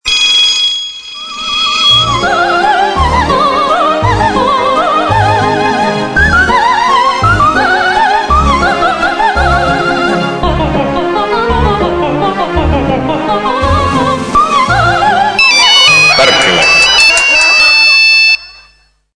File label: big waltz